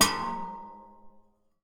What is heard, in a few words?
Classical Guitar Buzz Pluck